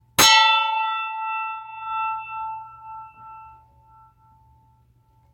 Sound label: Short deep ring